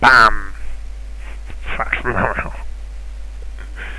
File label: deathpain